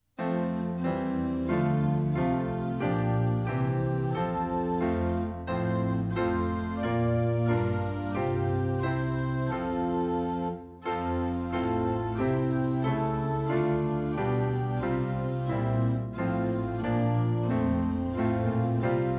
SDA HYMNAL PRAISE AND WORSHIP SONGS INSTRUMENTAL TUNE